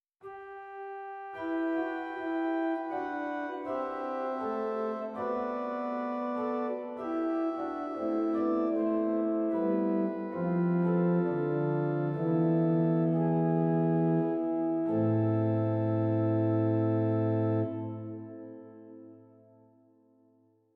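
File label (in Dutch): Hoofdwerk Octaav 8; zwolle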